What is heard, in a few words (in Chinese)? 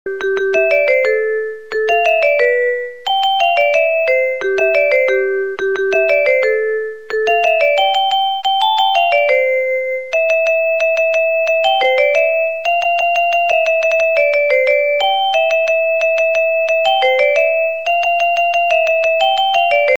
音乐铃声